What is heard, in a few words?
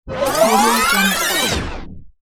tape rewind with poof; dubstudio